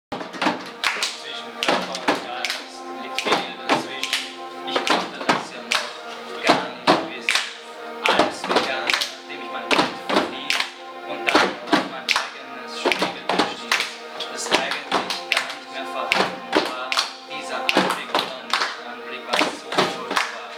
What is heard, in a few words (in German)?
Rap Oper; Junge Oper Wien